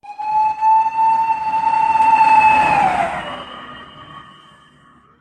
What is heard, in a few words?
fire engine